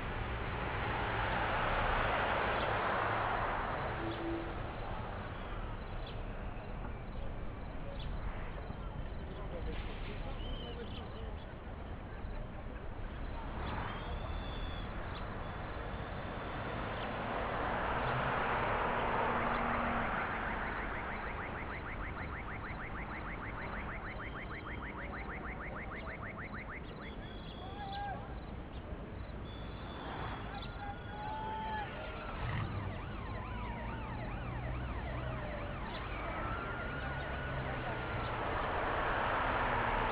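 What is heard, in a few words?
Ambience Parking Lot; Street Cricket Champions; PSP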